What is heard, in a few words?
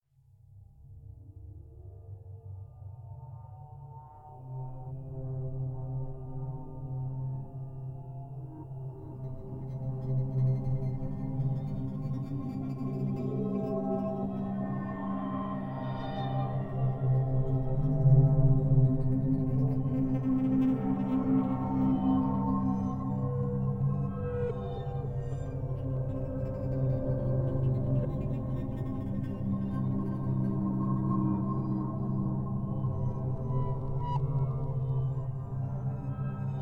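hall ambience; Identity V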